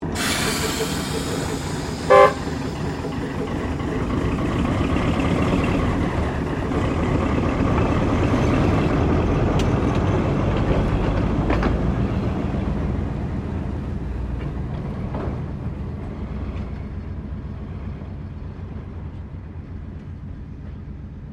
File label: Train Diesel Shunter V8 Class 1955 Tasmanian Moving Off; Bellarine Tourist railway vehicles; Trains